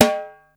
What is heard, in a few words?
segal tak, Yamaha Motif ES drum machine; 300 Drum Machines; Yamaha Motif ES-Series